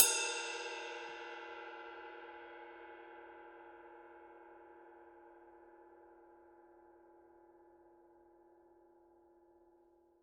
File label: 22 blue bell ride body; Forgées à partir d’un alliage secret de bronze; signature; paiste